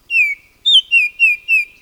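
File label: oriole baltimore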